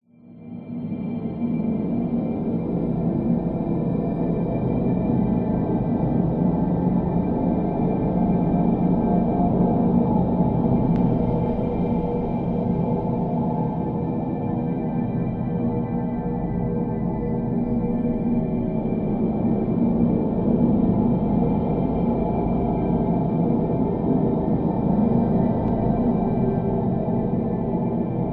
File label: science fiction atmosphere large spacious internal reflective dark eerie